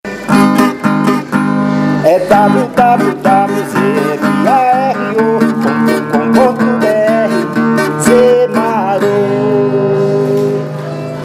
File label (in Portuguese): vinheta cantada